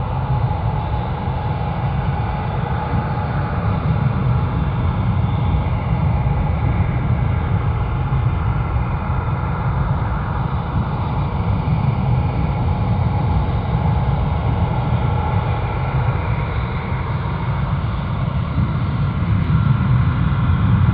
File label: Diner Amb DarkRoom Loop; horror and atmosphere fx; Silent Hill Downpour